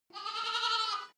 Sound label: goat lamb baa distant